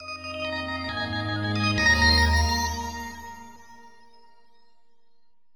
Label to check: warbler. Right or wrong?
Wrong